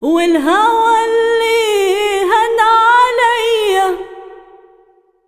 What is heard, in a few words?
SINGER A, Arabian Traditions; USB Soundscan vol.43; AKAI